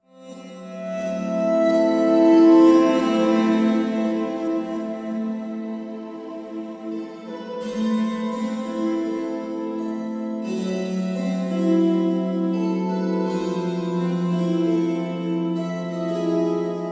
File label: Ingame Garden Melody